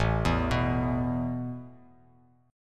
A Suspended 2nd, Bass Standard; Asus2; arpeggiated; x,0,2,4; JGuitar

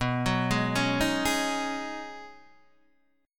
B 6th Add 9th B Guitar DADGAD, chord

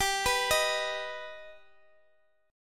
D sharp Augmented G, Guitar

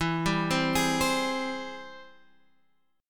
Ab Augmented Major 7th E, guitar

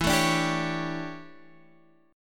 D Major 7th Suspended 2nd Suspended 4th, chord

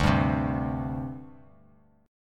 D 7th Suspended 4th, down; strummed; JGuitar

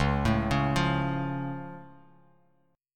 C sharp Augmented down, chord; arpeggiated; JGuitar

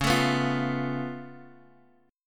Db Augmented 7th Db down Bass Standard; strummed

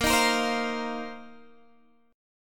Bb Suspended 2nd Suspended 4th, Guitar, DADGAD

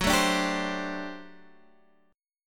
G Major 7th Suspended 2nd Suspended 4th, Gb

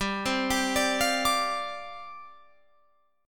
G 7th Suspended 4th G down Guitar Open F; Listen to G7sus4 arpeggiated; G7sus4 Chord

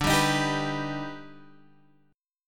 D sharp Augmented Major 7th D, chord

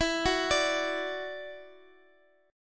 D Major Add 9th E, chord; Dadd9/E; arpeggiated

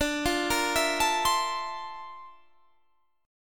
Bb Major Sharp 11th D, chord

